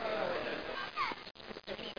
tv sound

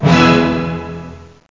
orchestral e maj, synths; Zoom - Release 2 (1996); Active Software

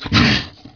Spit; Deep Fighter

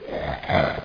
rex eat

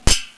Clash; Curse of Dragor

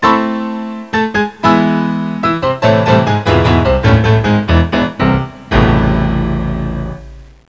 PIANO

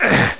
grunt; doom

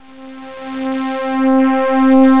sweep pad; gmplay

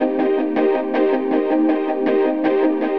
AMBNT 160; Ambient

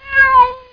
Cat; Maxis; Widget Workshop™

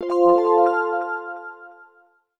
login; Gizmo; interface sounds

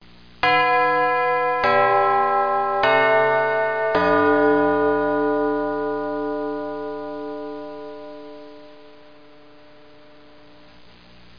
clock bells; Broderbund Print Shop Multimedia Organizer Deluxe Edition

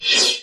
Menu Sel; TrickStyle

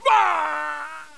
SCREAM; CLOSE COMBAT

